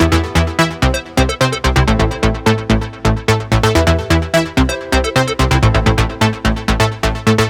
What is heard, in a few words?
128BPM Melody; Vengeance Samples; The Deep Kit